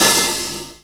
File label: HH Open, Hi Hats; R&B Drums